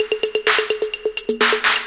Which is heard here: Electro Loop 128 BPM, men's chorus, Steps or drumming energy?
Electro Loop 128 BPM